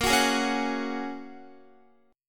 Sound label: Bb Minor 7th Sharp 5th, Guitar; Bbm7#5 strummed